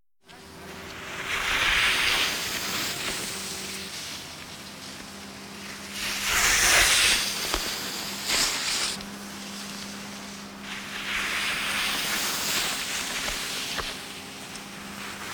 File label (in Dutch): Voorbij Skieende Skieers; KMP Film Video Sound Effects